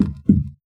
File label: Moody Park Playground Perc; Houston